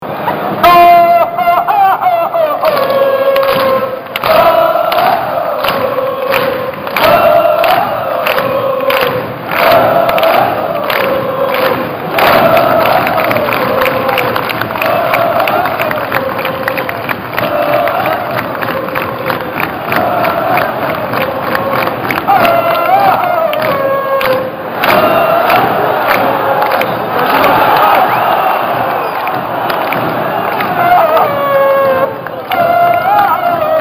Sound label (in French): Ohohoh, chant